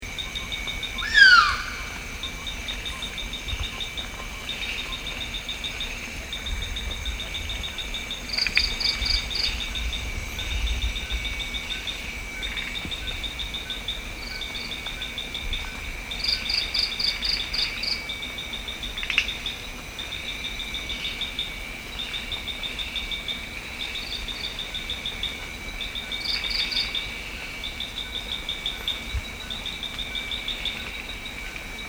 filin golos

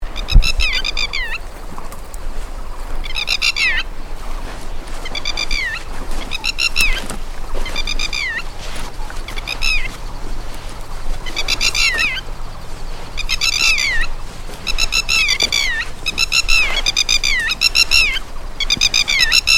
chibis ukrashennyy golos

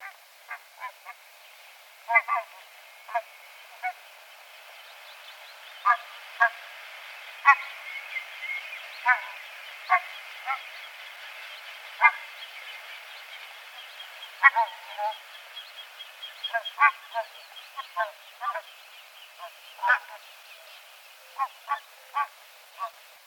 gumennik golos

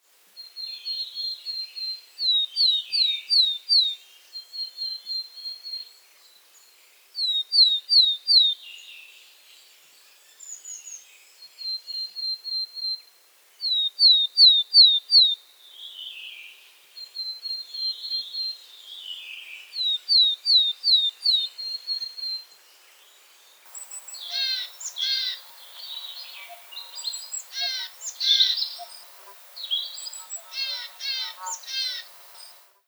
burogolovaya gaichka golos

